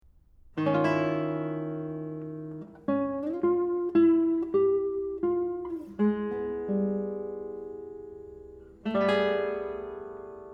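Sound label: Recitativo Guitar Variations; Kees Arntzen; voor gitaar solo (fragment); Gitaarvariaties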